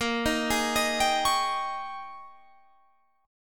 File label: G sharp Major 7th Suspended 2nd Suspended 4th, guitar; 18,18,18,20,20,21